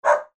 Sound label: dog bark